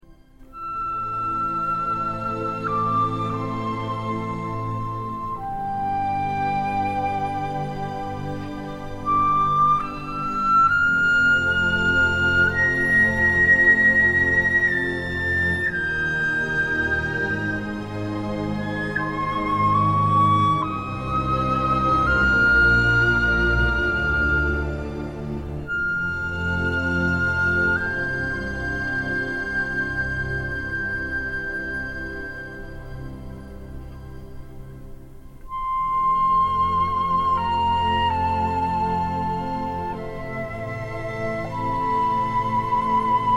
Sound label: Flute Concerto